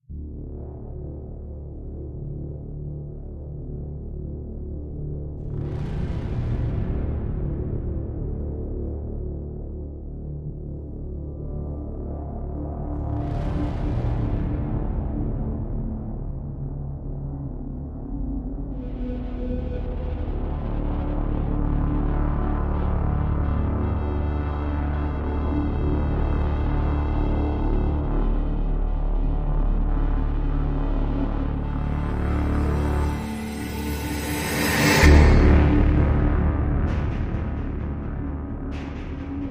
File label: No Perc